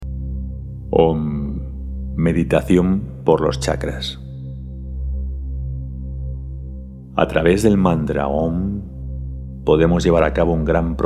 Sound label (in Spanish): AUM Meditacion Chacras español; mantra om